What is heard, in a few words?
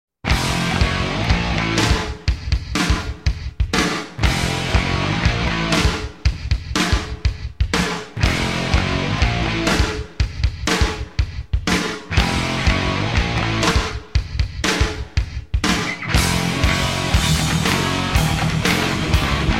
Instrumental, metal